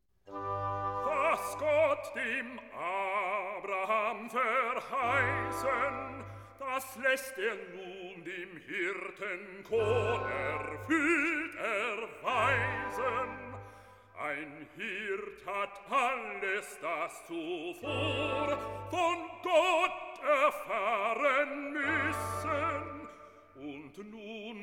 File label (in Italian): Recitativo; Bach Johann Sebastian; BWV0248; Weihnachtsoratorium; Was Gott dem Abraham verheißen